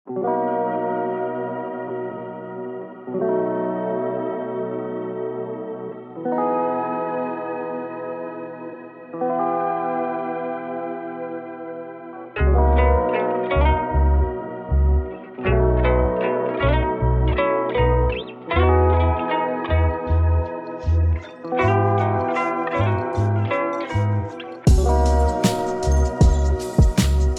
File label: lofi